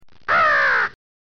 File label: growl, crow call